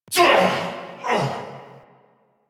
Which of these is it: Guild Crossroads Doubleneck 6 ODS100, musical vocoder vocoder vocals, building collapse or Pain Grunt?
Pain Grunt